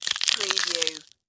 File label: Carcass Flesh Rip